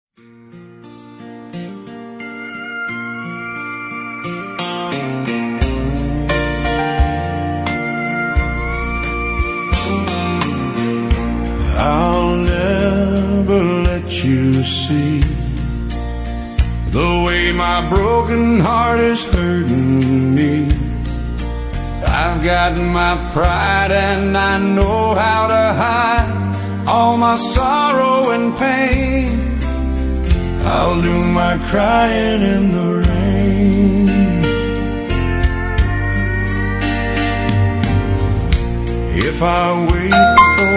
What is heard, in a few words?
Country